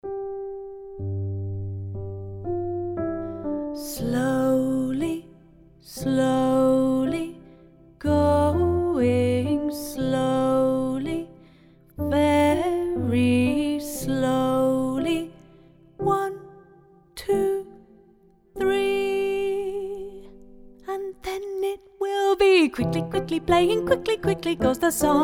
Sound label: Slowly and quickly; Music-makers